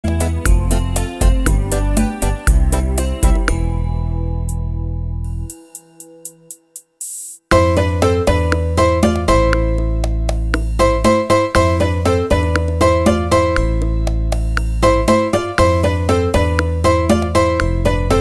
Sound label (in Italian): bachata